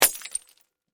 Impact Beer Bottle, Smash; Smashes (Sound Effects:Objects); BlastWave

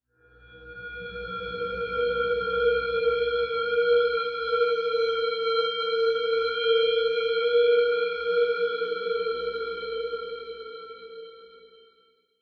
Swell Horror Descending Tone, sound design; Swells

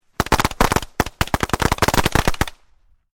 FIREWORKS CRACKER SMALL CLUSTER; Variety of fireworks being detonated

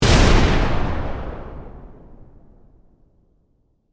Explosion Heavy; Warfare; Bomb

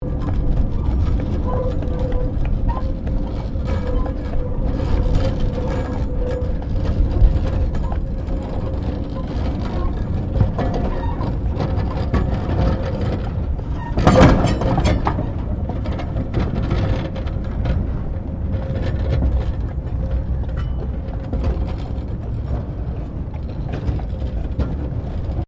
Tram Horse Drawn Carriage Rolling; Horse drawn tramcar; Carriages; Vehicles

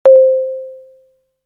Lift, Arrive At Destination Beep: Lo-Fi; Beeps; Objects

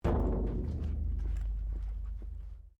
METAL IMPACT QUIET; Metal Sound Effects; Metal (Sound Effects:Materials)

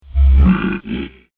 Bog Monster; Monster Sound Effects; fantasy

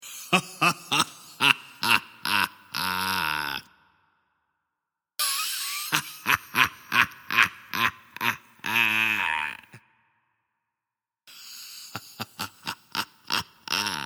Evil Laugh, Sinister evil male laughs; Horror

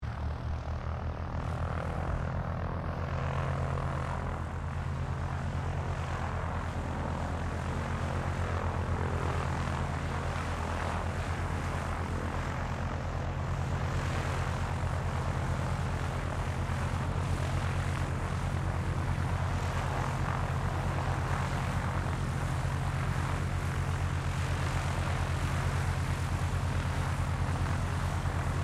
unknown twin prop idle prep takeoff, Airplane; Various unidentified aircraft; Vehicles; Aircraft Collection

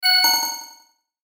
SHIMMER HIT, Shimmer Hit High Delay; Blastwave

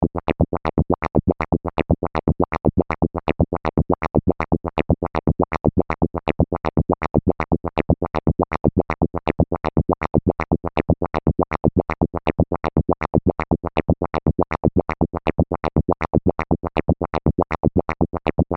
Filter Gurgle, Scifi, Filter, Gurgle, Electronic; Science, Fiction; Tech Sound Effects

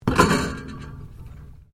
MECHANICAL CLANK RUBBER METAL

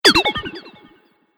Robot Laser Death Ray, sci-fi robot sound effect; Robot Sound Effects

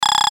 Text Readout; Digital; Sound Design; Multimedia; Blastwave

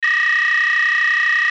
DATA STREAM; Digital; Multimedia; Sound Design; Blastwave

